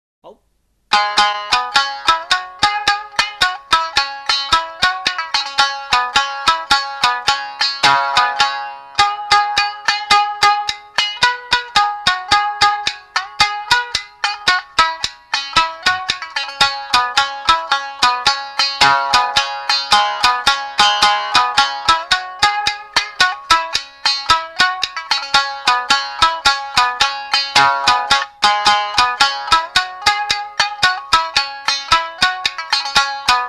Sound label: Niagari choshi Shamisen